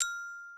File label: gloken